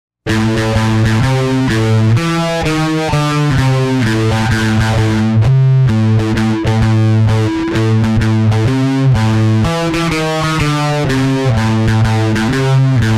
dry and wet, ampex

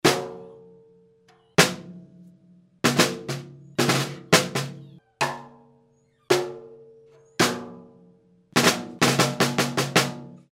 pearl forum snare 14x5

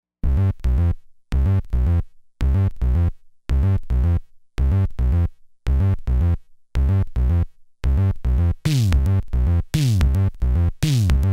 raw beat quad analog drum synthesizer; dr zee workshop

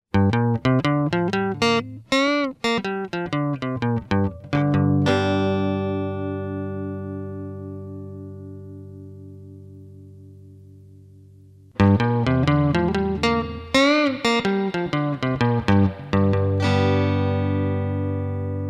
bogen mpr 6 guitar; dr zee workshop

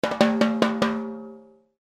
roto tom 10

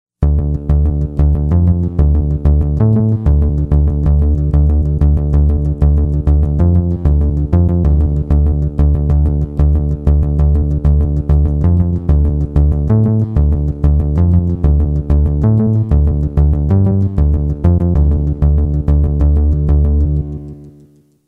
synth bass

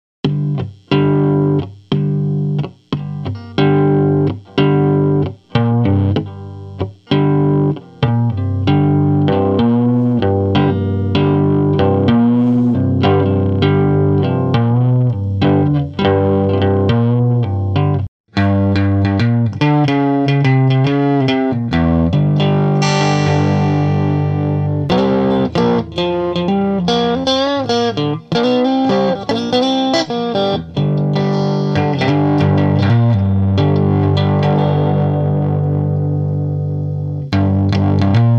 amp head blues rock overdrive; dr zee se cdc amp head

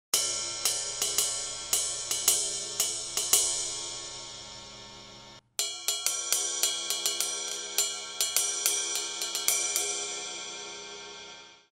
paiste power ride 20

